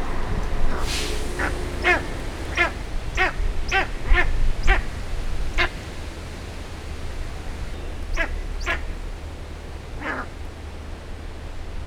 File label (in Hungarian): szemetesauto is erosszel, pusztai sas; Győri Zoo 2014